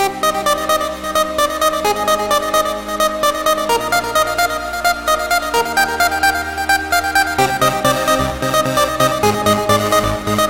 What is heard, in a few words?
dance electro